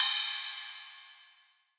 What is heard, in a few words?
Ride; Hats and Cymbals